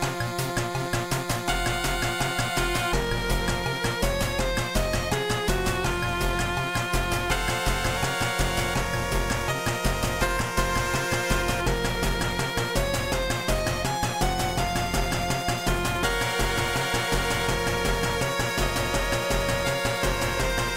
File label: 8bit; Rick Morty